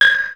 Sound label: MISC2, Classic drum machines, SR16 PERC; musicradar-synth-samples